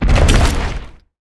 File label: drop